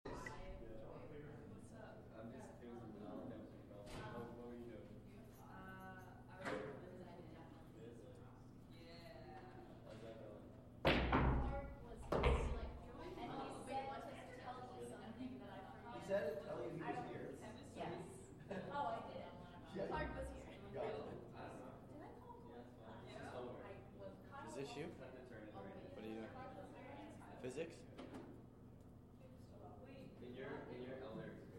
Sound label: Hall Lounge; Pomona; Wig